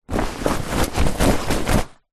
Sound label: household bbq cover movement; zapsplat